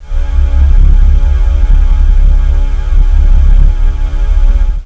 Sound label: shield